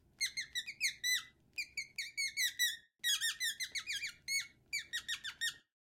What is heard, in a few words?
miscellaneous mouse squeaks; soundscrate